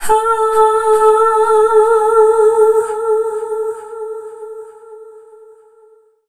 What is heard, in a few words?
Dance Vocals, FEMALE